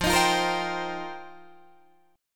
F 9th Suspended 4th F Guitar Open D, chord

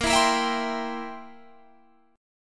C 7th Bb

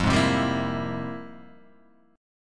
F-Minor Major 11th, down; FmM11 strummed; JGuitar

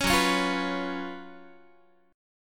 F sharp Major 7th Suspended 4th, Guitar; F#M7sus4 strummed

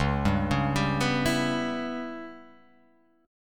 Db Minor 7th Flat 5th Db down, chord; arpeggiated; JGuitar

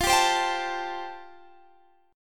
G 7th Suspended 2nd Suspended 4th F chord

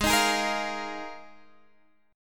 D sharp Suspended 2nd Suspended 4th G sharp

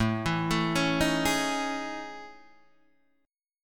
A Major Sharp 11th, Guitar, Standard; 5,7,7,6,4,4; arpeggiated; capo=4

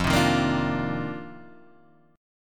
F 13th Suspended 4th F, chord

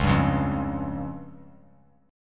C sharp Major Flat 5th; C#Mb5 strummed; JGuitar

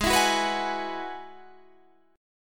F sharp 7th Suspended 2nd Suspended 4th, G sharp

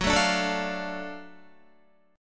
Gb Minor Major 7th Sharp 5th Gb down Guitar; strummed; JGuitar

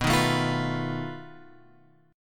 B 7th Flat 5th B, guitar chord; B7b5 Chord {7 6 7 X 6 7} strummed

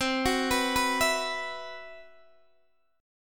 C Major 7th Flat 5th C, Guitar Open D

